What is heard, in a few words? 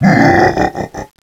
wahahaha; DS; ML BOWSERS INSIDE